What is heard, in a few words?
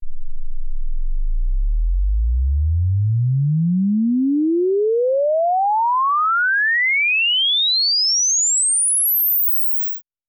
exp swept sinus 10Hz 24kHz